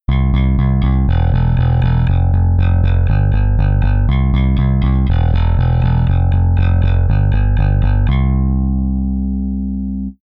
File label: bass effector comp